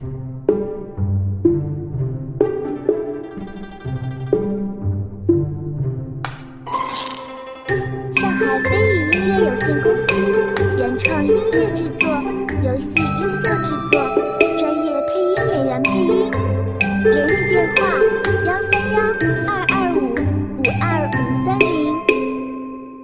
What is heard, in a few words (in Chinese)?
轻松悠闲俏皮 叮咯咙咚呛 menu